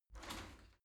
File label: shower slide door slide short open